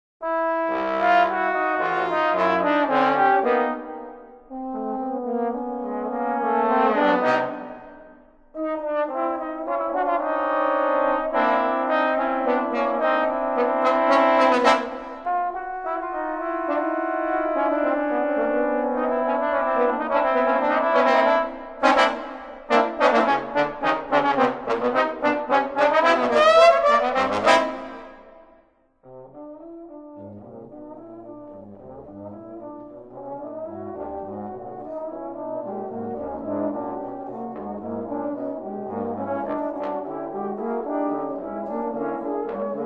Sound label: String Quartet Allegro